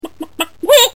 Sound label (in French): Poulet